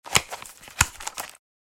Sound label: Brain teaser solve puzzle solution; leisure sound effects